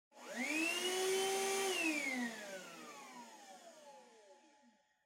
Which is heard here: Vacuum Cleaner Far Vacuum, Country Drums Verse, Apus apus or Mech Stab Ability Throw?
Vacuum Cleaner Far Vacuum